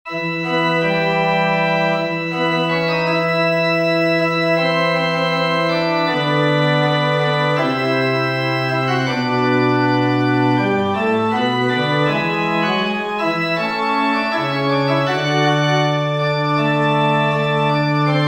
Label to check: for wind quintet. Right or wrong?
Right